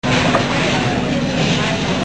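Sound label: Restaurant Sounds, Crowds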